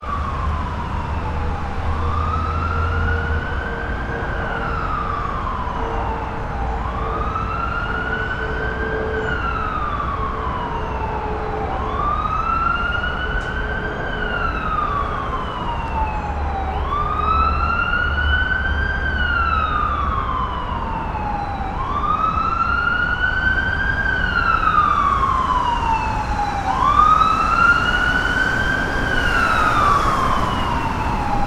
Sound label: siren cemetery